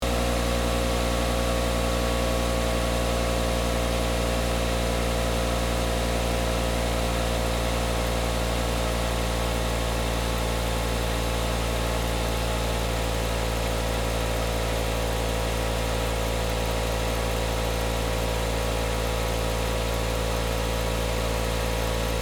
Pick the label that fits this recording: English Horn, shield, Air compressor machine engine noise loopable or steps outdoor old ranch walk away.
Air compressor machine engine noise loopable